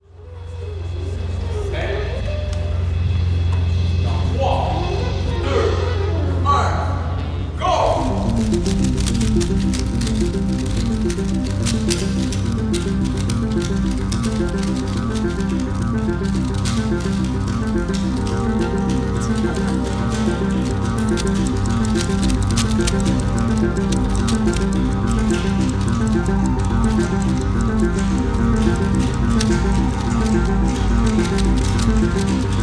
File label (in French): Electro